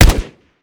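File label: akilo47 fire player, weapons; viper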